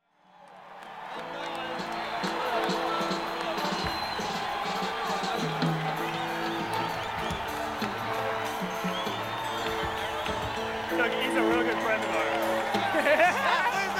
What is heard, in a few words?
Crowd Tuning; akg c522